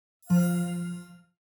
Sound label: Order Placed; Blutag; Dreamr